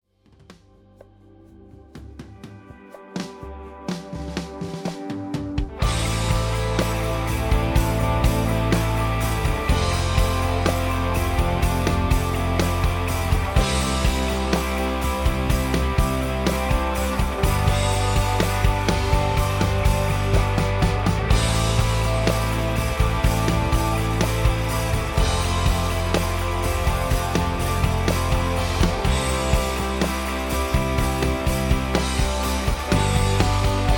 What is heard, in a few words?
rhythm